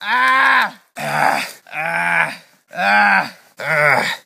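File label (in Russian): разнообразные возгласы солдат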